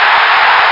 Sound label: goal